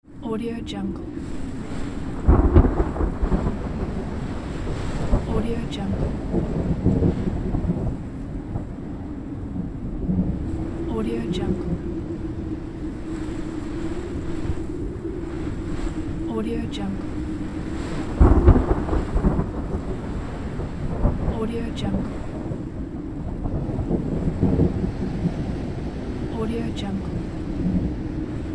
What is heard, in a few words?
storm thunder